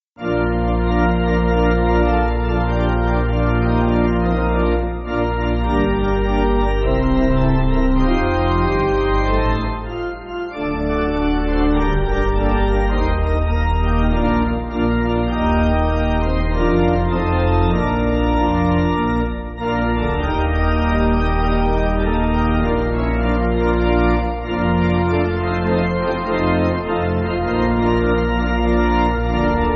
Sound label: Pipe, church music